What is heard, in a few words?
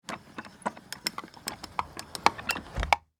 Household Window Close Lever Crank; Cleaning (Sound Effects:Household)